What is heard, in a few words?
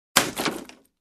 Wood Crackling Hit